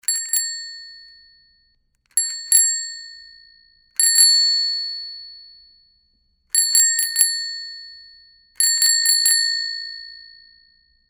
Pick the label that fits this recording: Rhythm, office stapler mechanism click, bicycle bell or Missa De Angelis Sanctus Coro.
bicycle bell